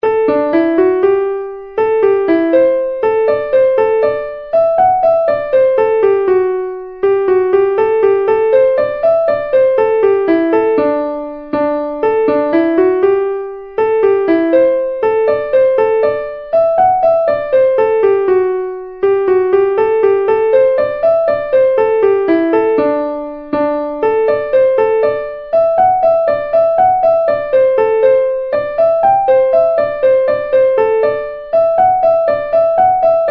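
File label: Jig, Traditional Irish Music; Garrett Barrys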